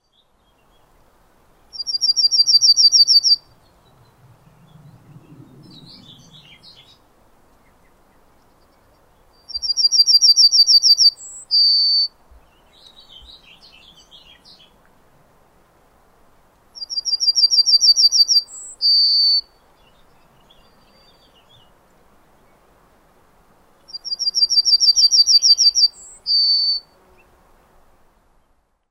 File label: yellowhammer